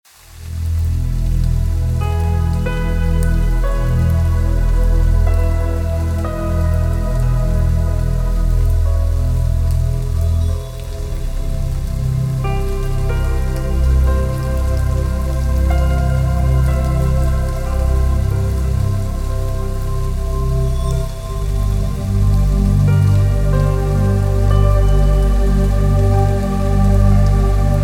rain in the paradise forest yoga zen relaxation positive sleep music